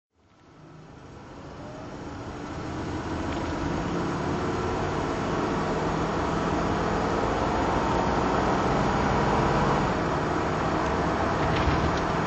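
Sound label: rover p5b drive, engine sound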